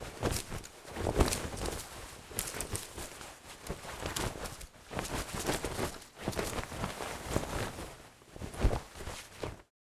Clothes shorts movements walking

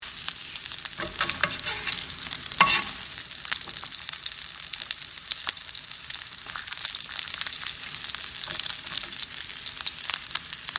French fries frying sizzle loop; cooking

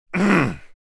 Frustrated male grunt; scream

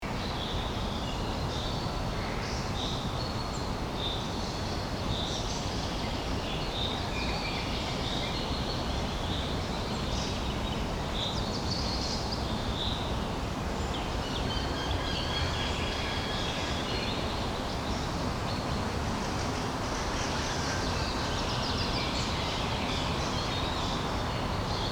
Park playground side street birds chirping loop; gfxsounds

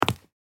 High heels single step on street

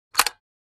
Professional camera shutter click

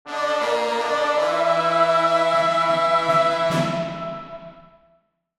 Video game reward chest opening epic

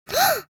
Little girl surprised sigh

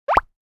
Designed pop up

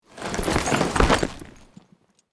Horse saddle and bridle movement

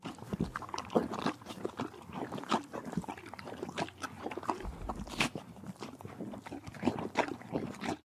Horse eating apples and carrots

